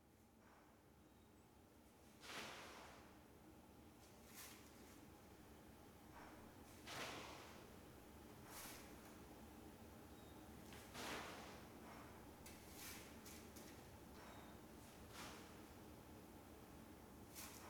投炭練習場での投炭練習音; すずしろ; 投炭練習、音探しの始まり

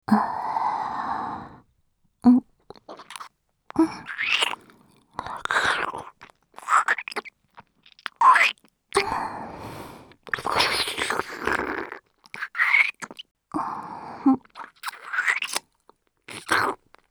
フェラオンリー

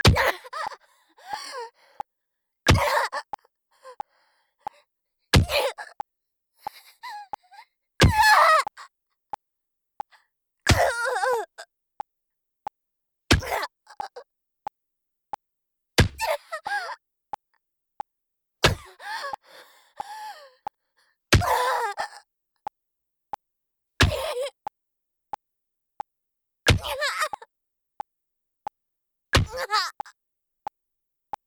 BPM90 メトロノーム

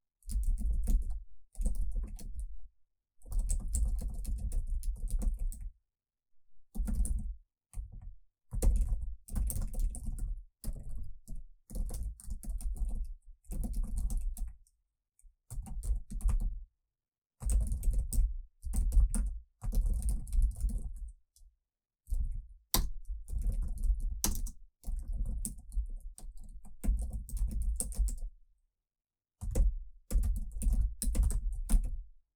タイピング遊び 左

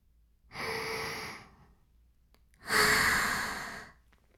フェラ音声, ASMR; 白くて黒い魔性円環

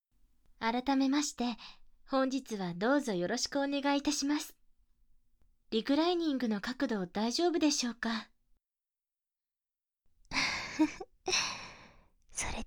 お口でお耳マッサージ 耳舐め, ASMR

